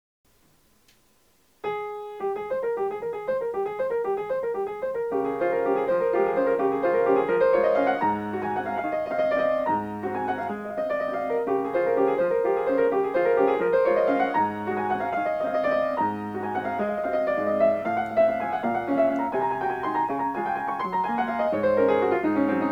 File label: mini waltz